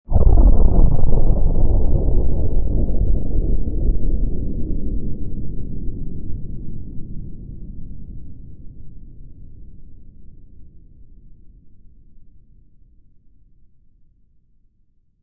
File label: Atomic Blast, Explosion: Distant, powerful, huge atomic or nuclear explosion; Explosion: Atomic Blast 02; Bomb Sound Effects; Lynne Music